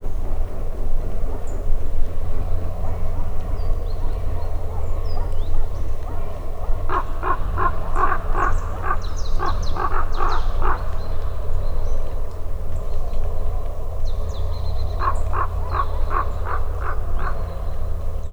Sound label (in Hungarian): halk dámszarvasok is, varjú; allathangok; termeszetben; labod